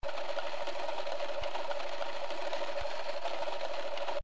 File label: Reel Reel Spin, slots; Bombo